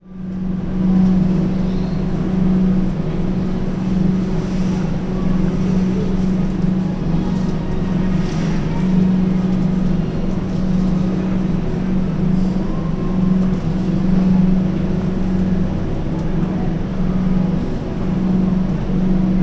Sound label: drone